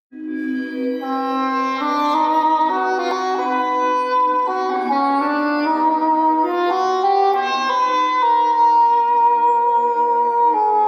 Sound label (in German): Englischhorn Sprecher und Elektronik